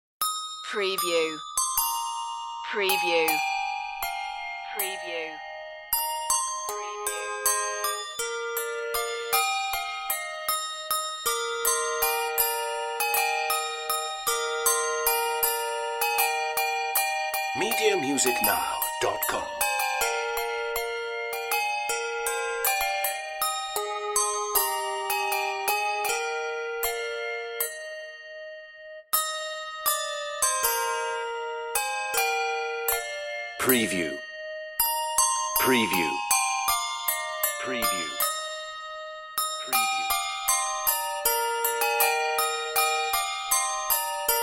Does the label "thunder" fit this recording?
No